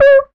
Beep; MMRetroArcadeSoundsPack

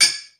glass

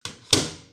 microwave door close

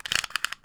Twist Cap, Pill Bottles

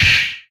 Gun; Guns; retro arcade; MMRetroArcadeSoundsPack

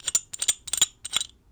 Pipe Wrench; Smol Mechanisms

